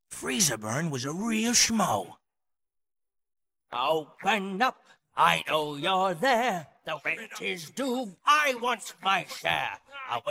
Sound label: vocals; Samples